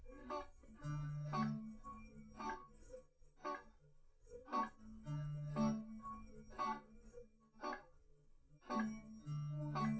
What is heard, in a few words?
test nodrums liverec